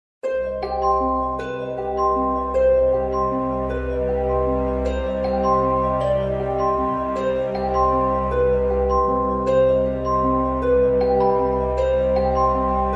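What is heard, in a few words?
Halloween music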